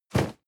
cardboard box medium place slide hard; objekte

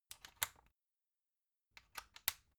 magnetic plug soft, elektronik; objekte

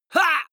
male fight hit shout; comedy-cartoon; soundpool

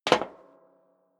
step leather shoes metal rattle single stair down; schritte

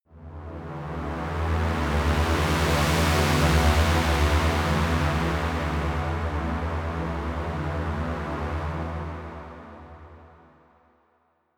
drone heavy saw bass swell long; musikelemente; drones

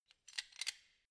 wrench close soft; schrauben drehen hydraulik

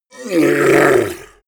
yeti growl painful; kreaturen

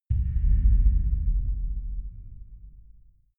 far outer space shadow, hits impacts

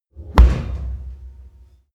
oven door close low

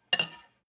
cutlery on plate clatter and scrape, gastronomie; soundpool

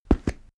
step stone walk

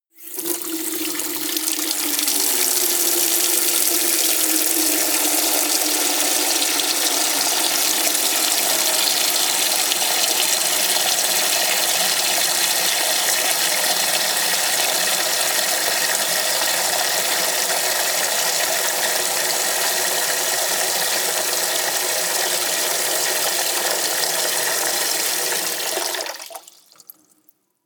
sink fill long; haus garten; badezimmer

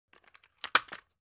drug package drop hard; berufe; arzt

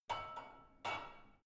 barbell only replace soft; sport freizeit; fitnessstudio

